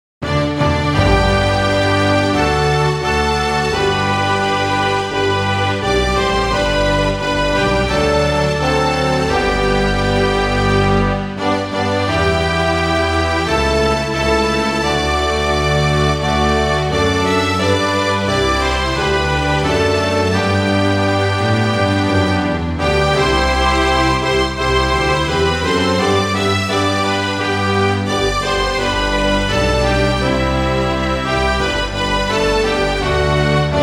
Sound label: Zimbabwe National Anthem